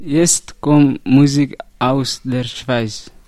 Schweizer Musik

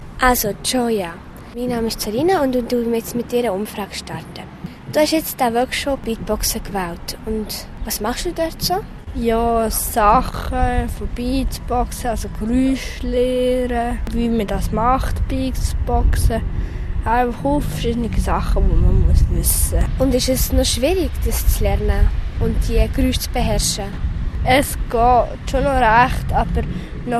Umfrage Beatboxen; CoolTour 2013

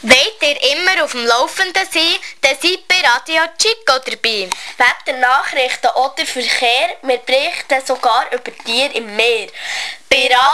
Jingle; RadioChico; Unterseen

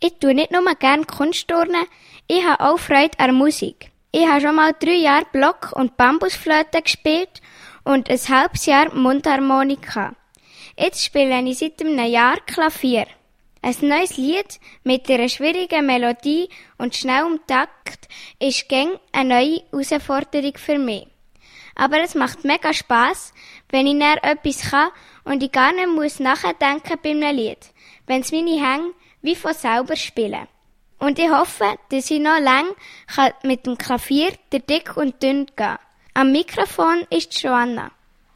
Klavier; Allmendingen

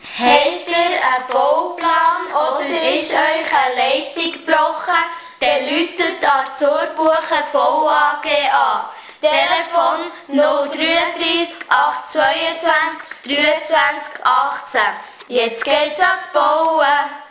Werbejingle; Zurbuchen Bau GmbH; Unterseen

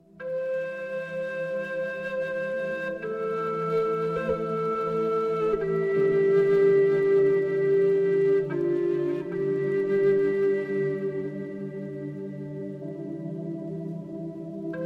Lachen macht glücklich

